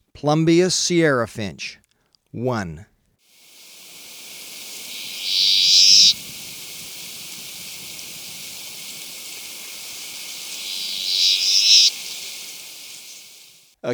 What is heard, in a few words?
Plumbeous Sierra-Finch, Birds of Ecuador; S. America